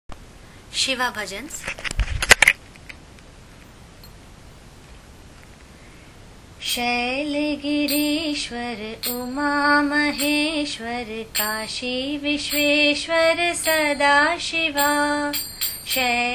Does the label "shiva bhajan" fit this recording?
Yes